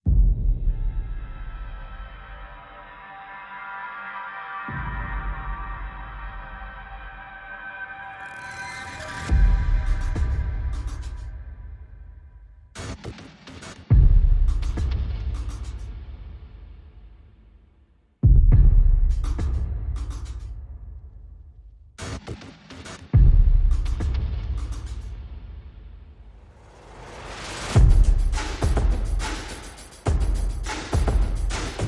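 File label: Percussion and FX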